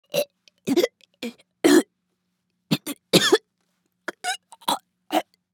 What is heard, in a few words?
Woman choking